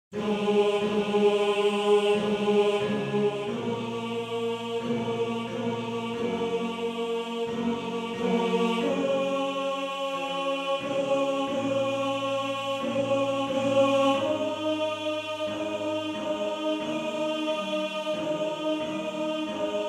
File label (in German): Tenor; Morgenrot; Tenor 1